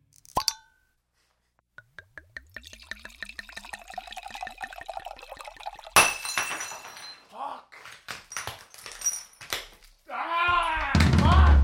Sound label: Bad Drum Solo